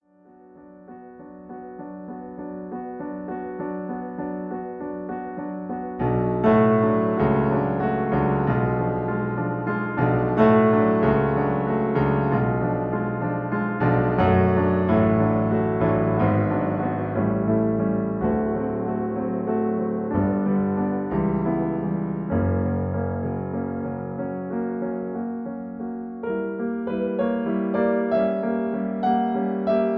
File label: Accompaniment B